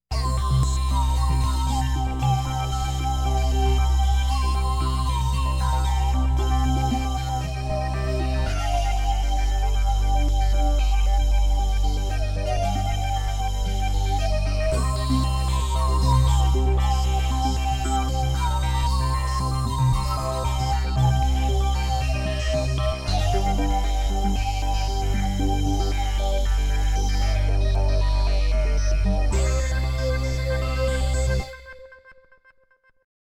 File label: Indian Flute